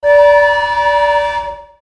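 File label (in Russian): сирены паровоз корабль вождение автомобиля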